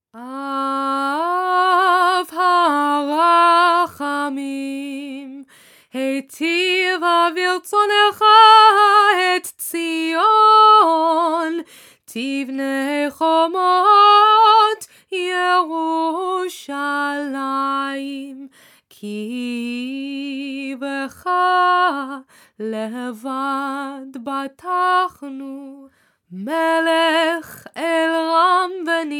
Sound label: Torah Service Av HaRachamim; rosh hashanah